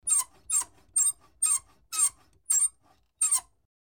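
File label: valve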